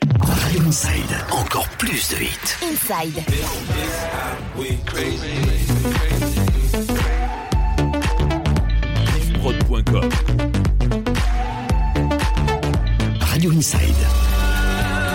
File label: Radio Medleys Hits; Radio Inside